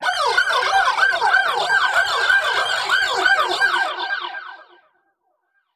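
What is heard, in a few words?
Modulation Processed, alarm siren samples 125bpm; musicradar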